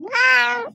Cat Meow

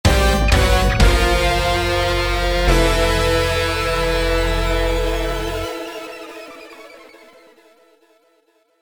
Fail; far cry arcade

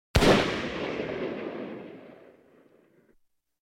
long musket shot

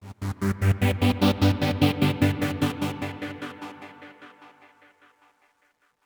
Oneshot Synth; zombie army 4 dead war ps4 stadia switch windows xbox one gamerip 2020

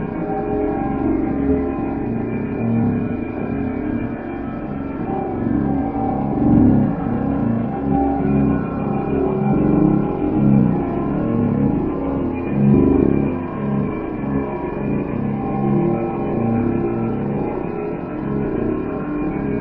combat ambience (ambience scary); HellSign

